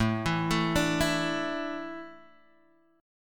A Suspended 4th, Guitar Half Step Down

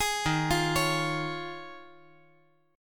D sharp 7th Suspended 2nd Suspended 4th

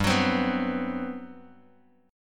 G Major 11th, Bass Standard; strummed; JGuitar

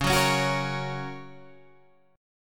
Gb Major Db

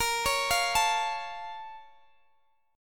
A sharp Minor Major 7th, down, Guitar, Drop D

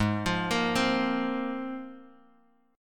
Ab 6th Add 9th Ab Bass Standard, Ab6add9 chord; arpeggiated; JGuitar

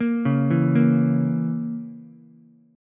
A sharp Diminished Ukulele, chord; arpeggiated; JGuitar

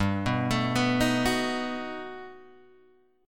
G Augmented Major 9th, Guitar, Open G

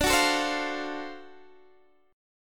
D 7th Sharp 9th, Guitar, DADGAD

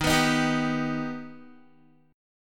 D sharp Augmented D sharp Guitar; D#+ strummed; 11,14,13,12,11,x

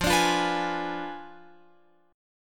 F Diminished 7th F down Guitar Open F; strummed

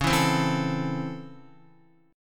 C sharp Minor Major 7th Sharp 5th C sharp Guitar; C#mM7#5 strummed; x,4,4,5,4,5; JGuitar

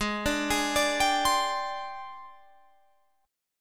G sharp Major 7th Flat 5th

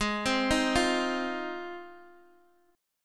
G sharp 6th G sharp down Guitar Open D, chord

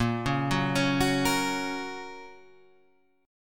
Bb Minor Sharp 5th Bb down Guitar Standard; arpeggiated; JGuitar

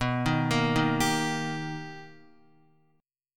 B Augmented Major 7th B Guitar, chord; B+M7; arpeggiated; JGuitar

